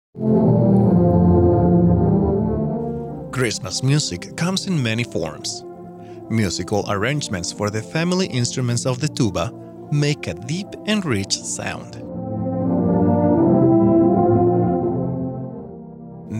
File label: Tuba Xmas